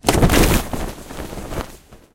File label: jump out parachute; War Thunder